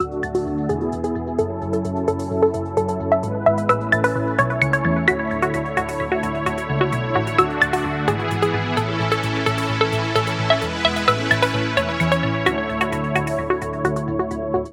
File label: sony xperia xz1 ringtone